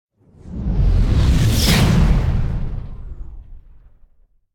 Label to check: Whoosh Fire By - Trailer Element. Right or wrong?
Right